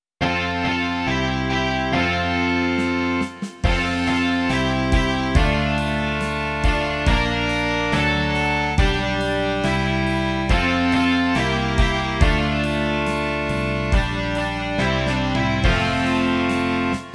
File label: rock